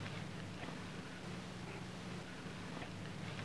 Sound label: line noise, Vinyl